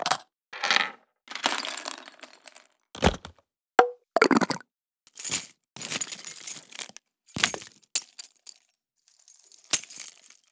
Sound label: Foley; ghosthack